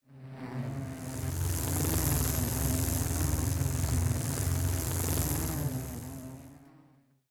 Giant bee fly around

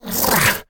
lizard attack

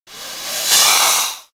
boa mumble

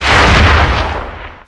Archelon sound; ARK Additions

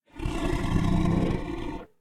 doedicurus idle aggressive

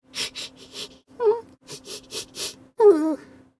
jerboa alert rainstorm

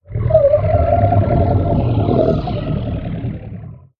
Basilo sound

